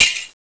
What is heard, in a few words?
SHAKER; CMI